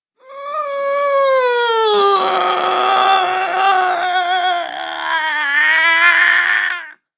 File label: Pushing - long, Adult Female